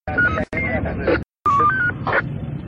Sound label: turkish radio